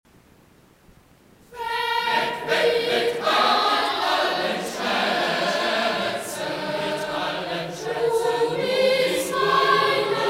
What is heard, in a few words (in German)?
Choral; Jesu, Meine Freude